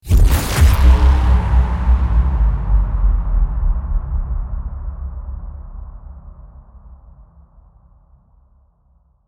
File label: portal travel; Minecraft